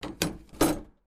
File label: publictelephone receiver